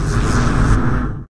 pod hit; hl2

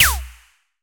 hitsound, Laser Guns

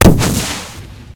rifle fire; hl2; Irifle

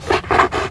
barnacle tongue; hl2

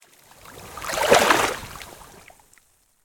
isolated lake wave short; BlueZone; Lake Wave Sound Effects

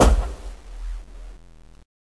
foot heavy, antlion guard; hl2

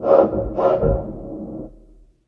peek sniff, antlion guard; hl2

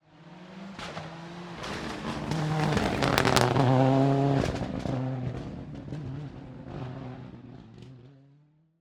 Rally Car Straight Pass By; Rally Legend

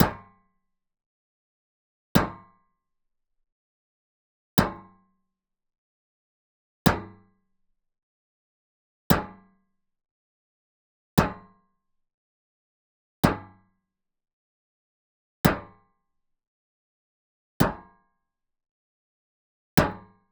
Oil Barrel - HIT - Hammer; Pole Position; The Metal Hit Sweeteners Library

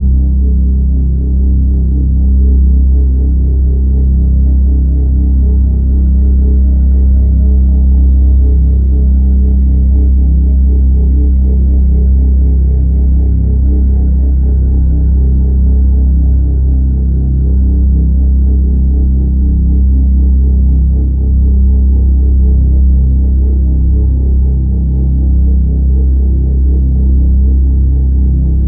Dark Ambiences Pad, Horror & Tension Elements; Tonal Elements; Showdown; Systematic Sound; Tonal Elements Atrox; Game Audio Bundle

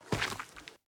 Single Step Footstep, Dirt & Gravel; PMSFX; STEPS Dirt & Gravel

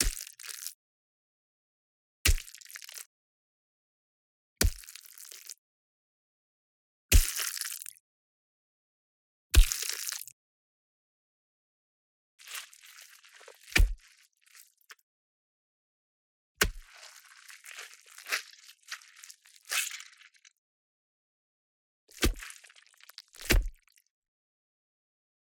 Flesh Drops on Floor, Gore; Justsoundeffects; Gore Mini Pack

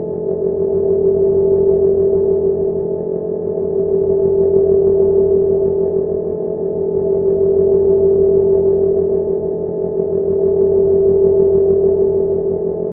Ambient Machinery, Background Machinery; Julian Ray

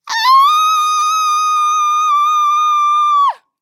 Female Scream High; AudioDesire

